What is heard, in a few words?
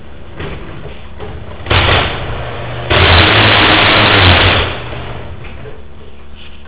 stripped genie screw drive trolley, garage doors